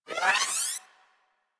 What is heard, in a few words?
Railgun Charge; Warframe; Corpus; Corpus Railjack; Biped Railgun